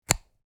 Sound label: Plug insertion cable click; headphones and speakers